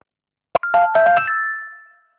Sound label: message alert